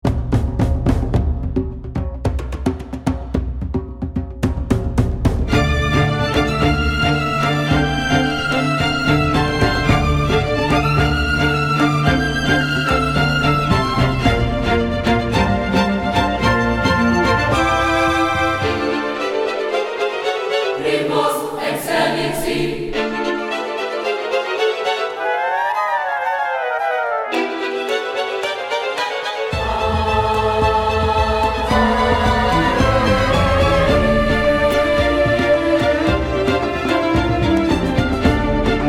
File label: Orchestra